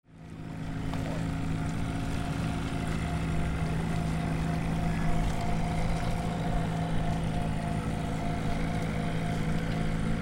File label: BOATS